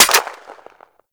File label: Fire Mech Single, Weapons; Warframe; Gorgon